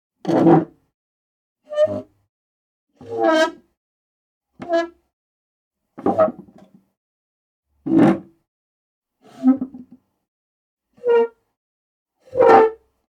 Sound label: Chair On Floor Movement